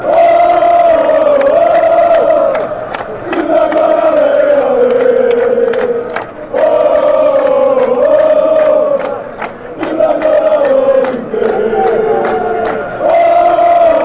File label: montenegro chant